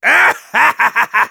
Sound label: laugh short, de; Demoman